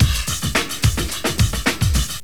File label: HIPBASS, DRUMLOOP; 2 seconds; eXtended Instrument